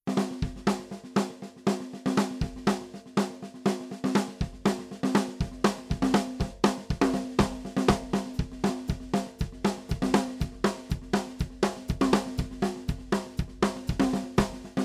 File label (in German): Drumbeat mit Mikro unbearbeitet